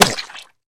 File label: Groin Crunches Short, flesh